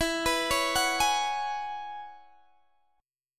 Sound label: E 6th Add 9th E down Guitar Open G